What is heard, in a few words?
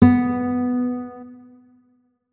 notes, guitar; Hideout Furniture